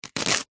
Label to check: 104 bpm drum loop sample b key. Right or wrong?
Wrong